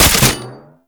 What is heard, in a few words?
Archgun Low; Warframe; Weapons; Archwing; Tn Nokko Archgun